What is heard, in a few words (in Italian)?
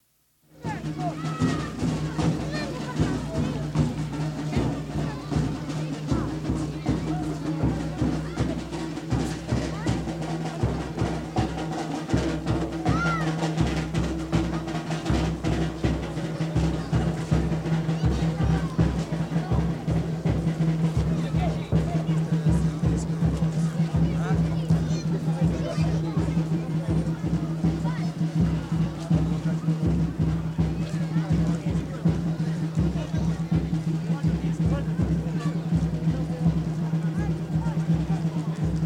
i tamburi dei giganti; Cardeto; Mammola; Cinquefrondi